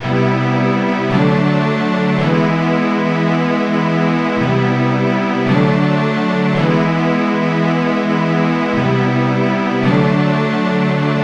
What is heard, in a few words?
strings